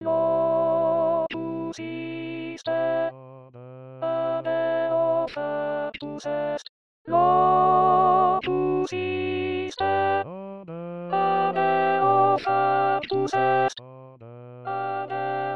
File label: Bruckner Locus iste alto